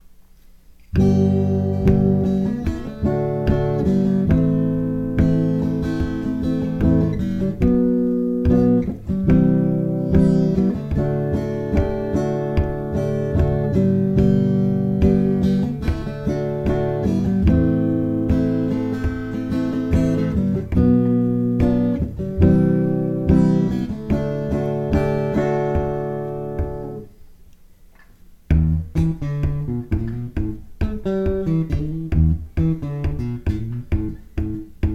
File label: stompbox